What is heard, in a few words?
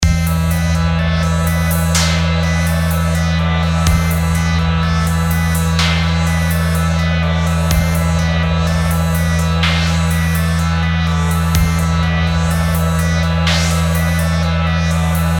80s spy montage